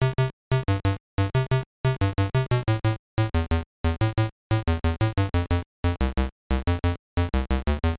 stage theme; popeye

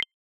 toggle on

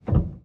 piano

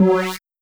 error

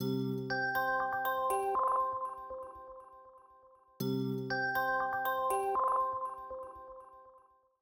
incoming call

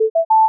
boot, terminal hacker; agentmag

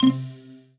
Hit; Indigo; 16bit

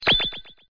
scanoutok

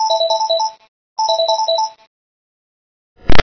phone ringtone; ember-webrtc-devices

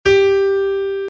Note G

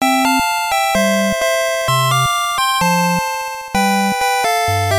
musical code

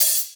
hat cats

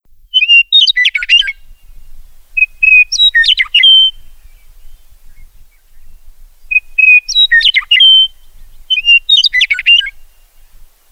bird

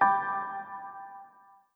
challenge complete

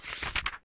next catgory

